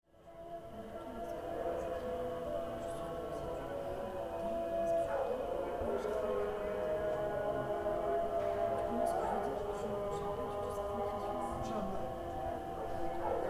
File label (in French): nefta terrasse hotel soir priere; resonances oasiennes